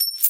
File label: coin flip; goldentavern